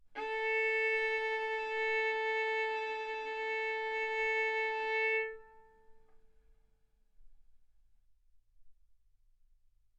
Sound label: Violin arco A4 B4; mf; sul A; stereo